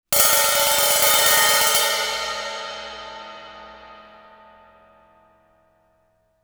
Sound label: crash stick roll, Suspended Cymbals; Percussion